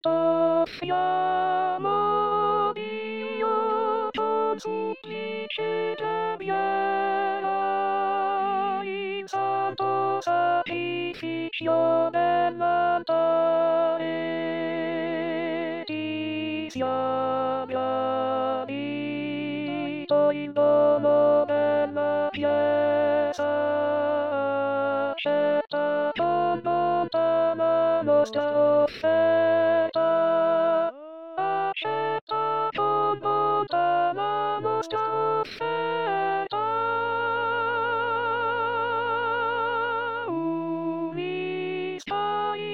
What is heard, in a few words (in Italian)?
Contralti; Ti Offriamo Dio